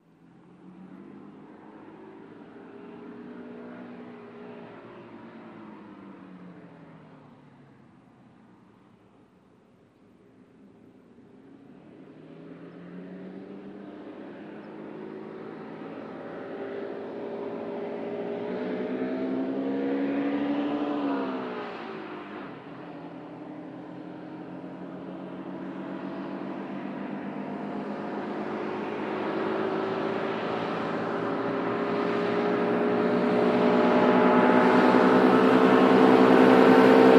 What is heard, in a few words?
Bus By Lng In Out Slw Me